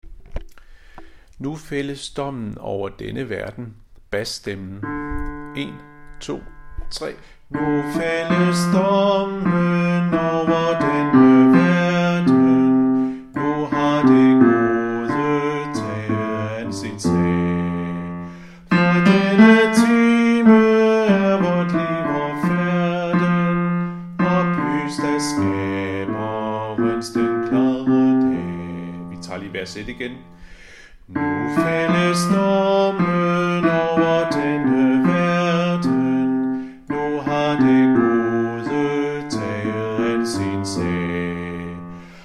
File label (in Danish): Nu fældes dommen bas; harlev koret